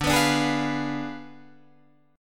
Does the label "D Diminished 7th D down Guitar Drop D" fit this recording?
Yes